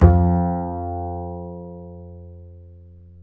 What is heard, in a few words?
F 3, Bass; Spectrasonics; Bass Legends